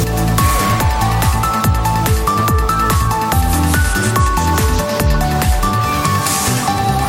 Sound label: Mega Win Bgm